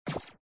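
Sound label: shoot Bullet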